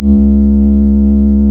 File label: REED C#; Big Fish Audio; Synclav FM